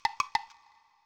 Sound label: Collect Clockwork Kazooie Egg; Banjo Tooie